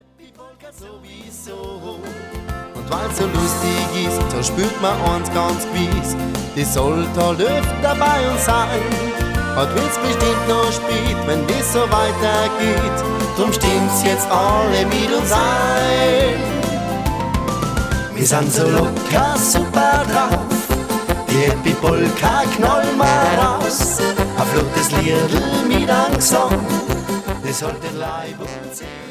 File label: Happy Polka